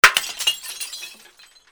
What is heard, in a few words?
BREAKING